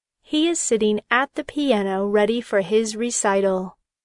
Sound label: piano; He is sitting at the piano ready for his recital.